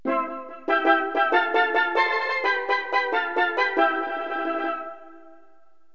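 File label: steel drums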